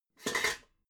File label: pots clank roomy